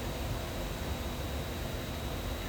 room tone; Golden Crayon Sounds

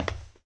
glass step; Golden Crayon Sounds

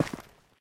ash step; Golden Crayon Sounds

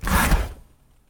door open; Golden Crayon Sounds

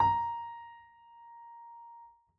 piano a#; Golden Crayon Sounds

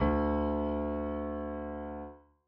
piano d; Golden Crayon Sounds

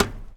metal step; Golden Crayon Sounds

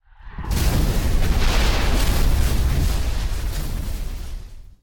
lose; RS Games Sounds; I Doubt It

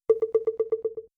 Folder Closed; ESAN VO Sound Schemes; ESAN Business SSound Pack

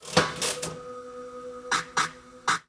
spin, Slot Machines; RS Games Sounds

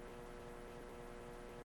hum; Golden Crayon Sounds

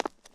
concrete step; Golden Crayon Sounds

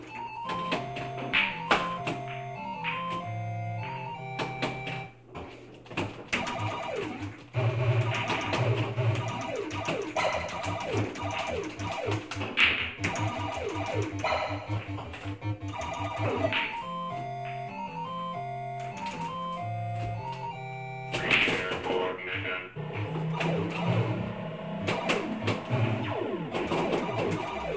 arcade; Golden Crayon Sounds

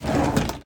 door open; Golden Crayon Sounds

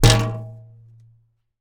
wall glass cabinet; Golden Crayon Sounds

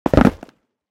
concrete land; Golden Crayon Sounds

